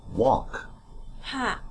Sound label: walk